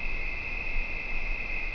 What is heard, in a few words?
night, ambience; svencoop; blackops